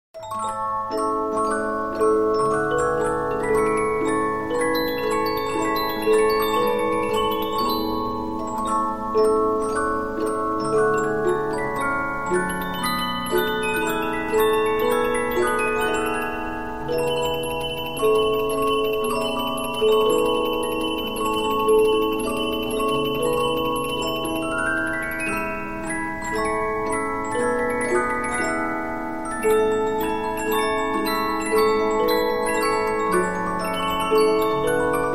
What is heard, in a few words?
Polyphon, 15inch disc musicbox